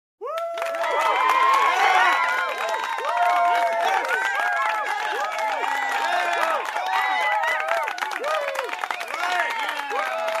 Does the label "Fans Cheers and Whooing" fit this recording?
Yes